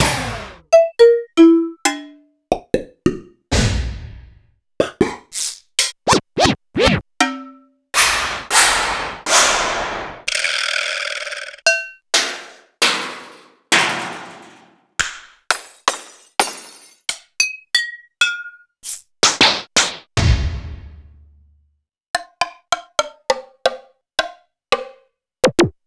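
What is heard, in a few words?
Alesis D4 Effects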